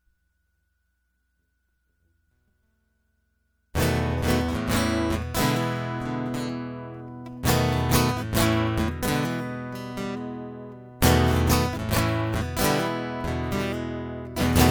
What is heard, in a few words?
Tight Guitar Prelude; hyper terrestrial